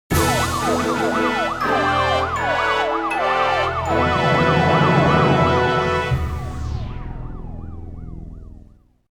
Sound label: Fanfare; Metal Gear Solid 2 - Sons of Liberty